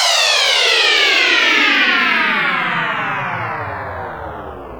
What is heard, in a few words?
WAVEDRUM; Back In Time Records; Big Bang